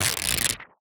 Xray Film Tear

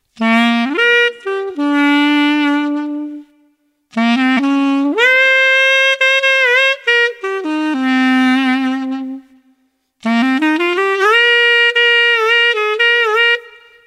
Woodensax Bb Eibe

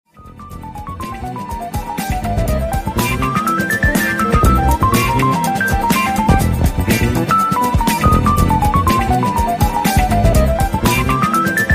Horns

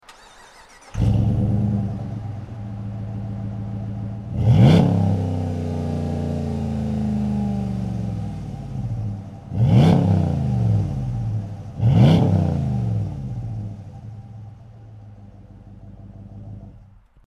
Truck Dodge RAM1500 5.7L MBRP

